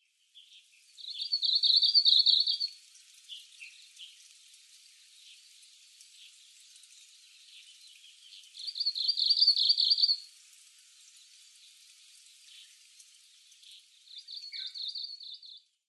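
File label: Japanese Leaf Warbler; meboso; hachimantai; koe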